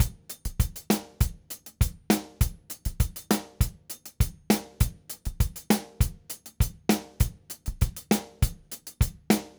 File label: Zouk, Latin Percussion, Drumset; Sonic Foundry (Sony Creative Software); Joe Vitale Latin Percussion